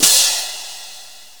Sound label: Hand Cym; GMPlay; Drums; TG300B